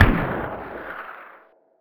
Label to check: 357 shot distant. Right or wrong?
Right